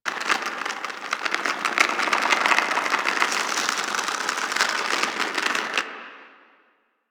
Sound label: Tendril Grasp Tendon Loop; Warframe; Broken Frame